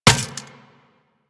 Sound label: Worker Metal Leg Step Default; Solaris; SolarisWorker; Steps; Warframe